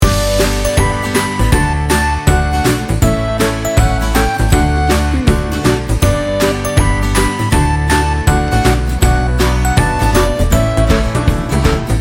sunny spot loop

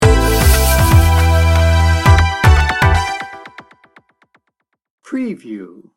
hip and groovy sting bumper

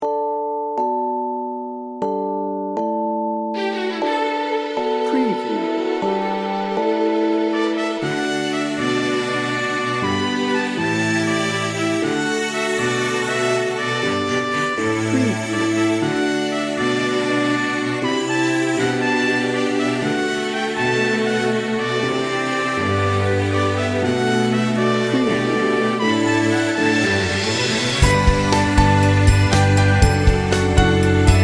smooth and inspiring

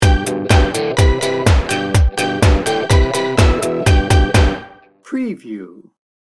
beach party sting bumper

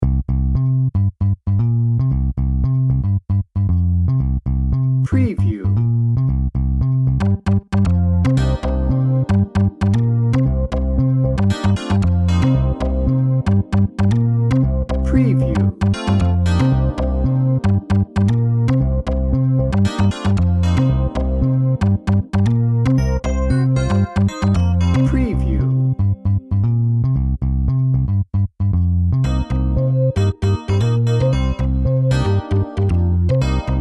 happy hip hop bed no drums

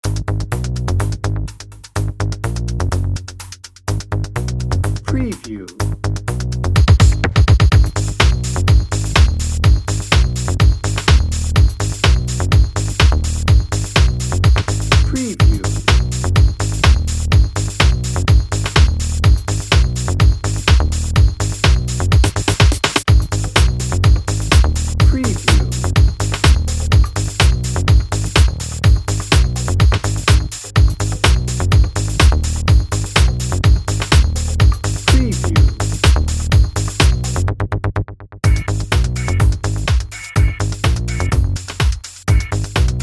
party beat dnb

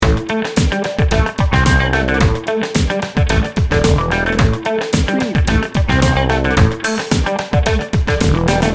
funky street loop

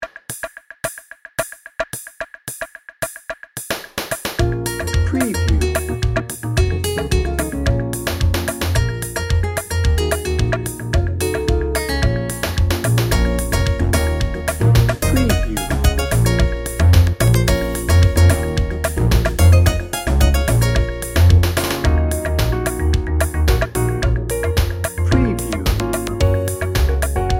samba shoes

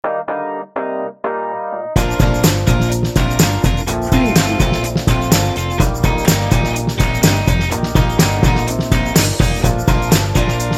happy upbeat retro soul bed

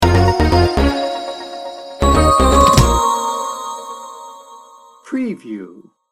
adventure beat sting bumper

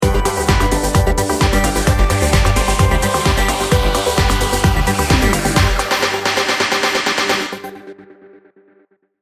technology driver sting bumper

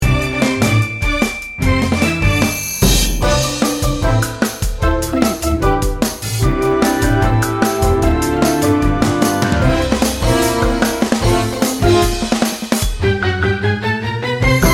50s game show

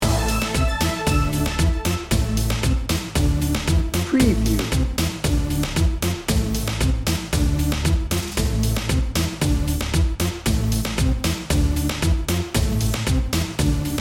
reggaeton heat loop

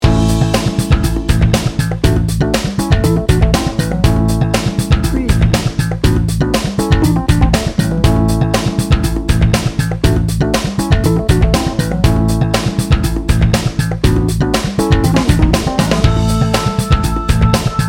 its a party bed

